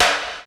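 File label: processed snare, drums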